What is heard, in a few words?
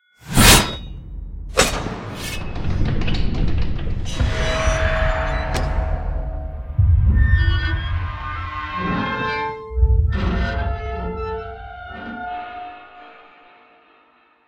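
Church altar Open; SFX Other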